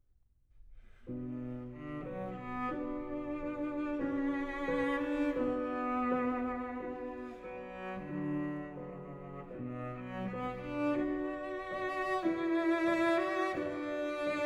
Prelude for piano in B minor; Nocturne

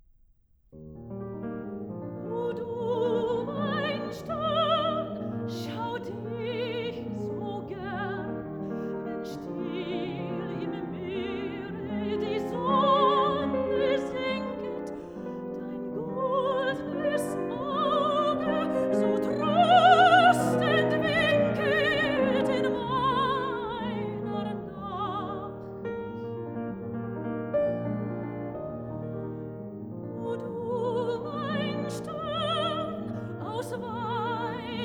song for voice & piano; Mein Stern ("O du mein Stern"); Robert & Clara Schumann

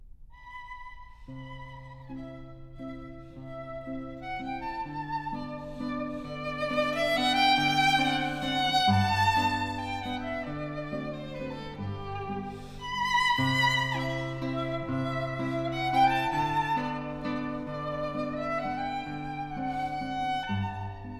for piano Walzer

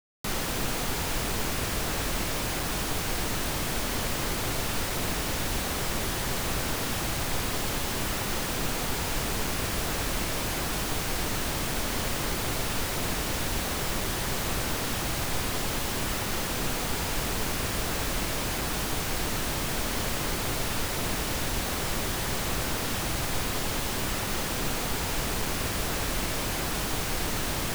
Left-Right frequency balance; Stereo Setup and Imaging; Surround Spectacular

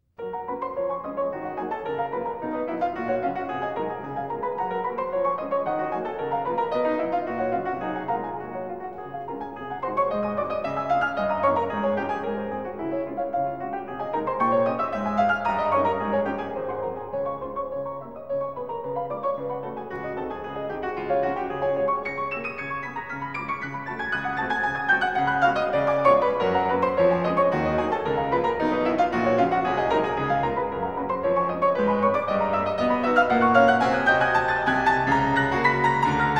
Etudes for piano, G flat major; Chopin; The Complete Études; Chandos

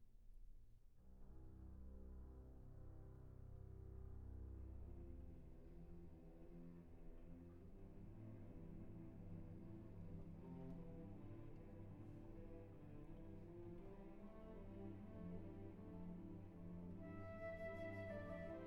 suite for orchestra; 4. the Nightingale; Respighi

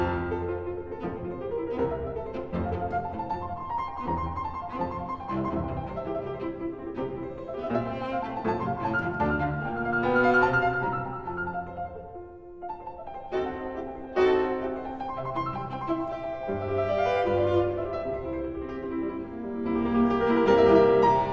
Variations for cello & piano in F major

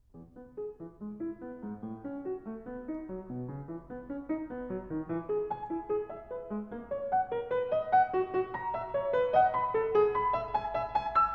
Piano Sonata in E major Variation Leggiermente; Beethoven

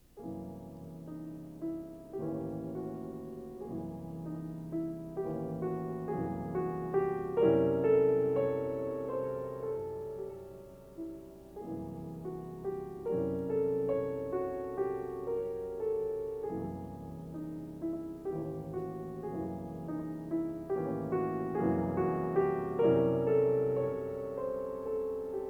Preludes for piano; Scriabin

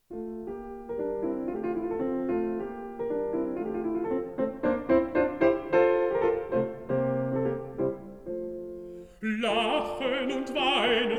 song for voice & piano

